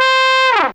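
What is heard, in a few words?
Trumpet Fall C4, Fairlight CMI IIe Brass; Brass2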